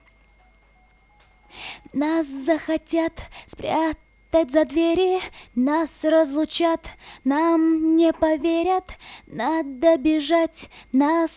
VOX Couplet, acapellas

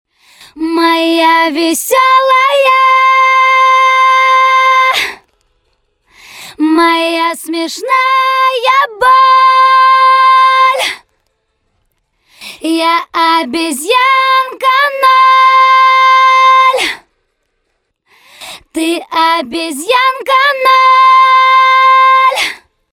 Acapella Chorus

